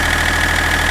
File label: ENGINE IDLE